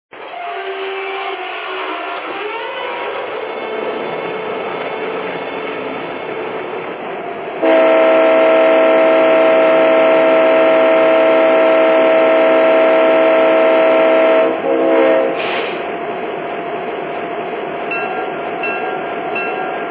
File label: U30C, trains